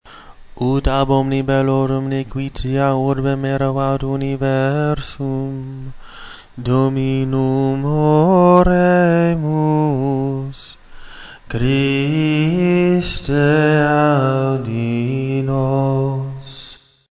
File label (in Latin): Oratio fidelium Tonus B, chant